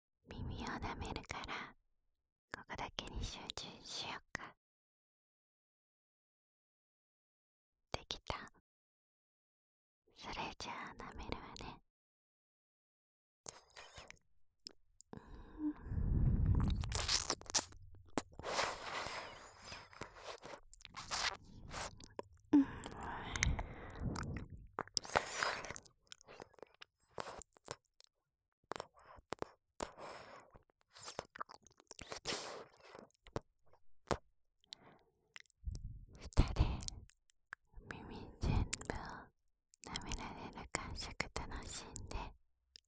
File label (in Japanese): 耳舐め 右耳, ASMR